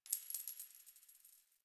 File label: Coin Drop